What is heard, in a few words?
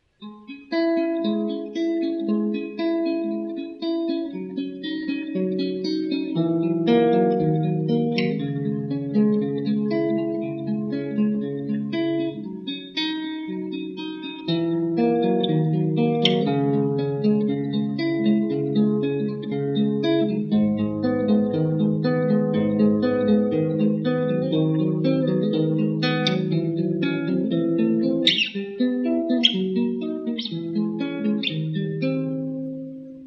guitar sonata